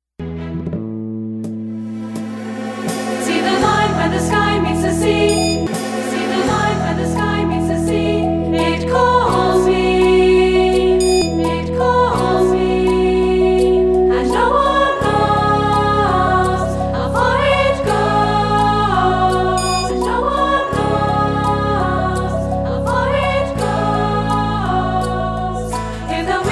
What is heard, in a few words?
echo track Chorus